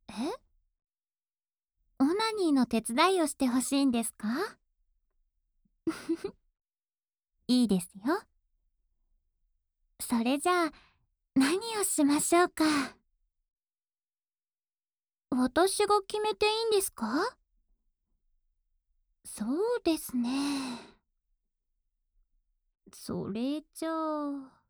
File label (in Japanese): オナサポ用耳舐め（右耳）